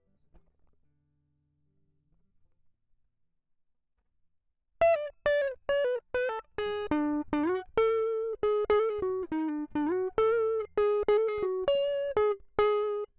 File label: Small Bright Combo; logic